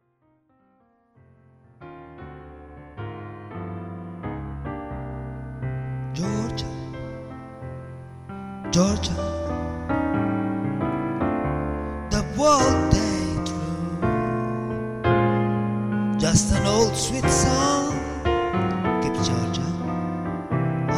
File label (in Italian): piano bar